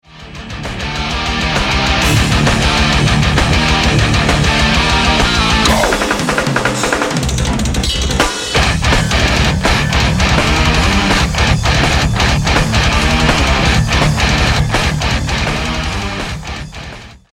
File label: sample, Trademark Fill